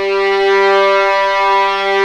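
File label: G3 legato, The Ultimate String Collection; East West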